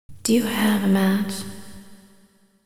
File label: match